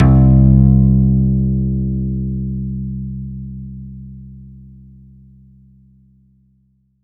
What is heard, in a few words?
C1, CLUB ORGAN; Roland JV 2080